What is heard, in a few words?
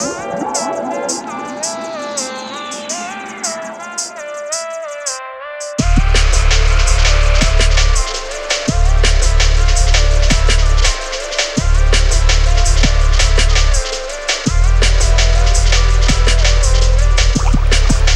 drums w some bass